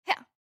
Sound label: Attack Spear; Jackie